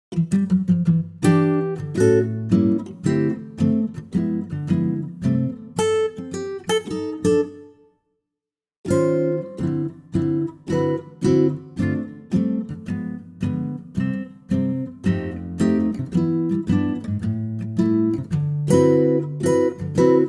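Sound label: C acoustic guitar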